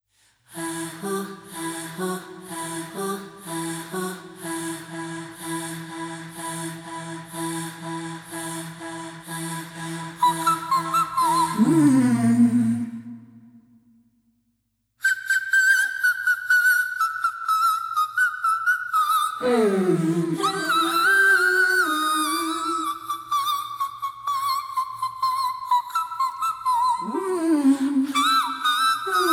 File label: Acapella